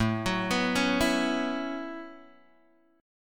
A 6th Add 9th, Guitar, Open G

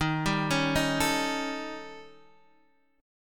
D sharp 7th Flat 5th, D sharp; D#7b5 Chord {X 6 5 6 4 5} arpeggiated

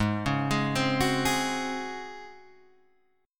Db Major 7th Suspended 4th Ab

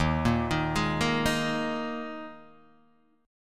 Eb Suspended 4th Eb Guitar Open D

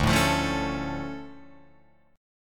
D Minor Major 7th, D, Guitar, Open F; DmM7 strummed; JGuitar

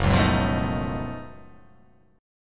Gb Minor Major 13th, GbmM13 chord; strummed; JGuitar

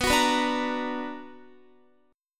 B Minor Major 7th, Guitar; strummed; 4E,4A,5D,5G,5C,6F

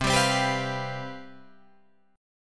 C Major 7th Suspended 4th, down, Guitar, Drop D

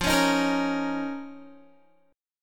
F 7th Suspended 4th Sharp 5th F down Guitar; strummed

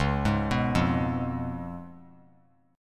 C sharp 7th C sharp; arpeggiated; C#7; JGuitar

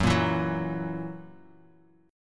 F sharp 7th; strummed; JGuitar

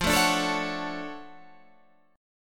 D-Major 7th F

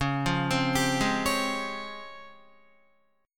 Db Major Sharp 11th, Guitar; arpeggiated

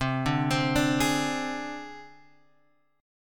C Suspended 2nd Sharp 5th C down Guitar Open G

